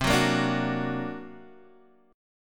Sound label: D Augmented 7th C, guitar